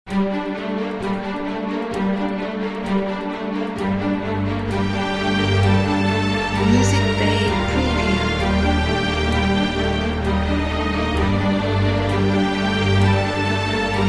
Epic Dramatic Trailer

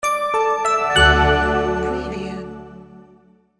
Light Drops

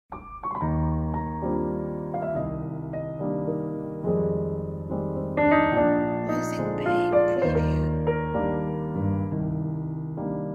Romantic Dinner Piano

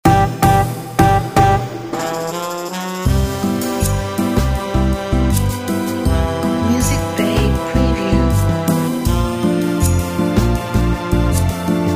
Elevator Bossa Nova

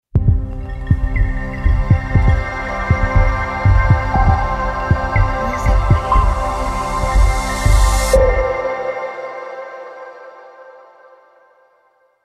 Ambient Clarity Logo

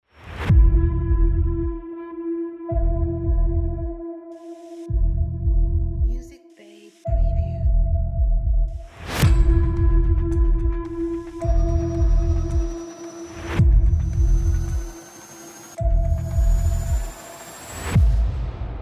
Sci-Fi Trailer

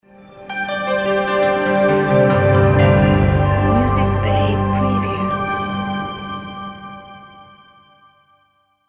Motion elegant logo

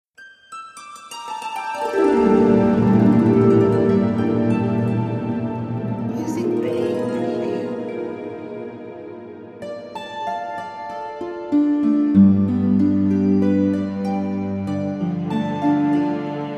Celtic Harps

